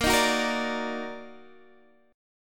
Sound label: Eb Minor Major 7th Bb, chord